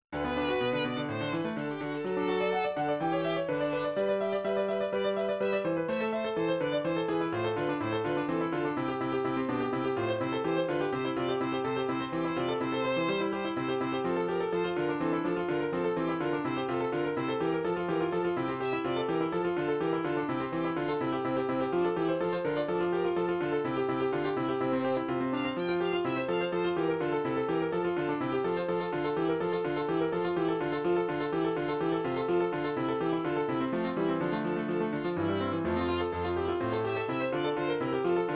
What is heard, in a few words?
violin